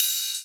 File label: Ride, Cymbals; Mutekki Media; Vengeance Electroshock Vol.2